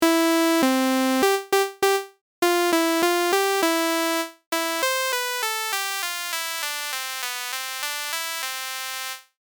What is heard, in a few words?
High Pass Filter